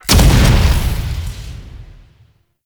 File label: Ground Slam Impact; Warframe; Sentients; Erra; Abilities